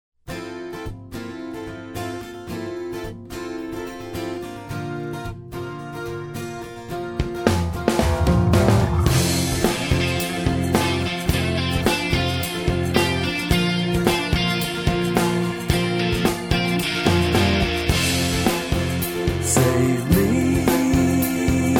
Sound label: Acoustic